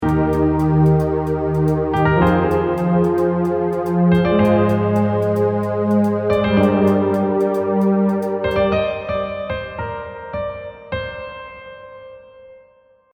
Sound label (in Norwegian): Instrumental; Gløsnytt